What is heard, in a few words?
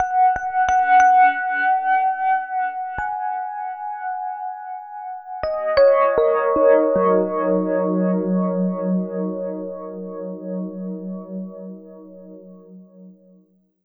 FM Keys; Sonic Foundry (Sony Creative Software); Slow Silhouette